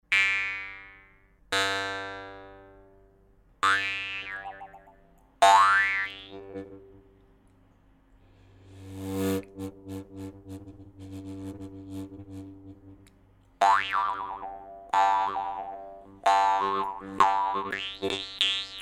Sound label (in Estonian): Parmupill korge; parmupillid